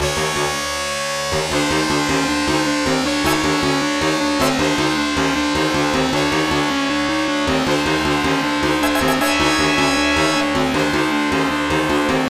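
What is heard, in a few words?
background music; Three Wonders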